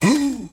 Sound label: Mummy Recoil